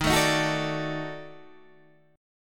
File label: D sharp Minor Major 7th Flat 5th, Guitar, Standard; strummed; chord; 11,12,13,11,10,10